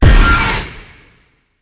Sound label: ghost from bag; smite; zhongkui